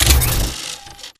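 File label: Sentry Turret Mech Weapon Ready; Boost Card; Titanfall 2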